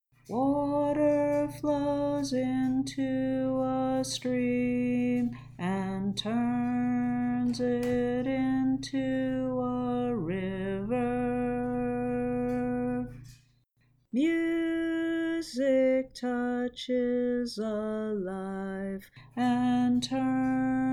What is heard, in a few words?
LOW